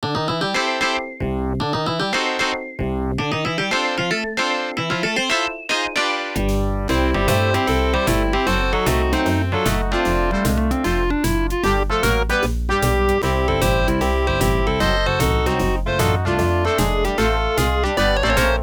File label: Big Band Jazz; Galaga '88